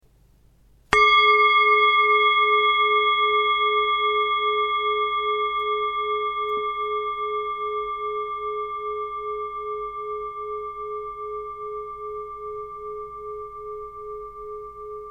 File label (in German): Klangschale